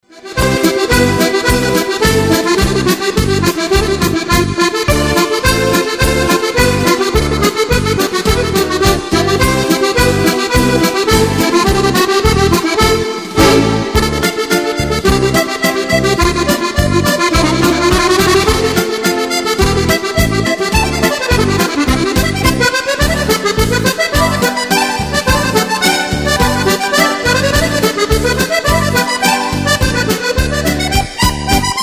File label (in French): La Polka Musette